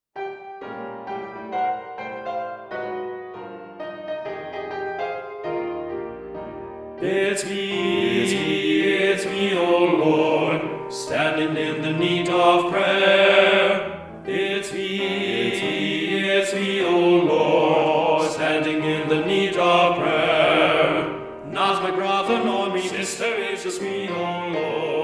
TTBB, jazz chorded piano part

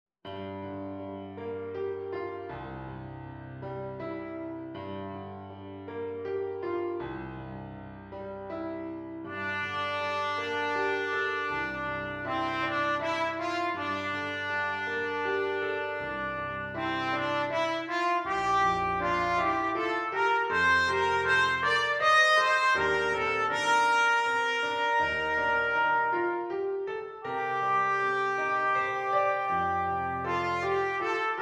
trumpet flugel or cornet

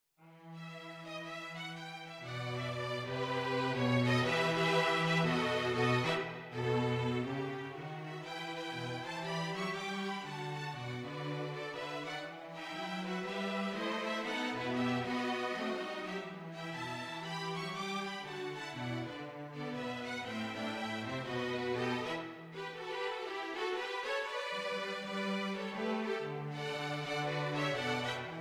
strings, jazz arrangement; string quartet; greensleeves; what child is this

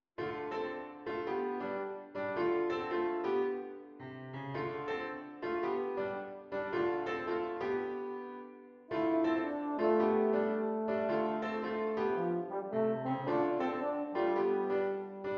Beautiful Wander Euphonium

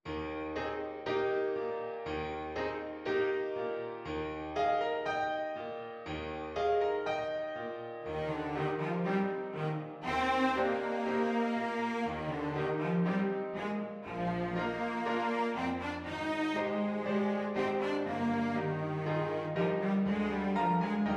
Cello

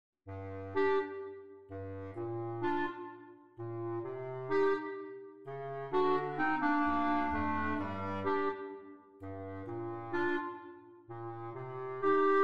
Clarinet Quartet

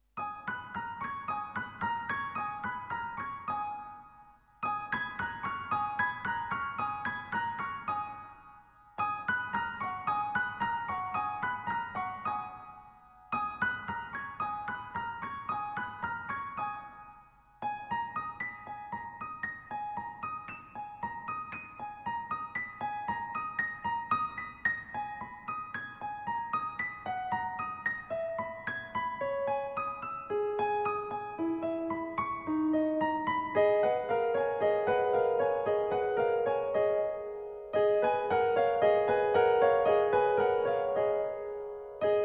The Spring, solo Piano; Dan Phelps

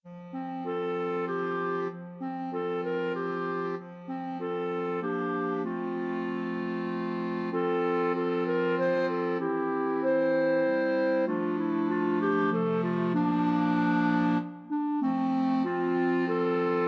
clarinet quartet

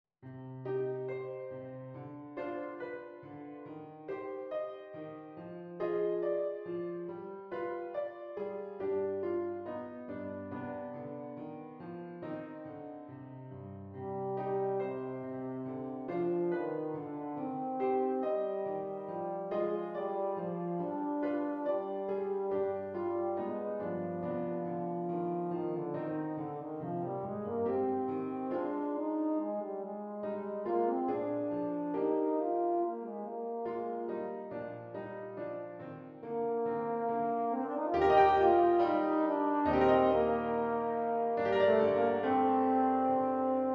euphonium

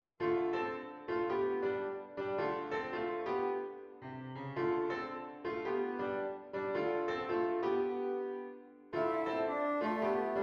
bassoon

